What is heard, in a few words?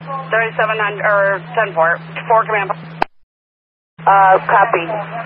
death; Ready Or Not